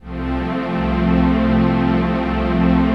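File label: trance pad; Best Service; XX Large - Pads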